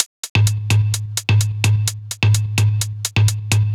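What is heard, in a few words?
No bass drum snare, Drum Loops 128bpm; Zero-G; Soundclash Nu Dance Electro; Acidized Wavs; Jackin Box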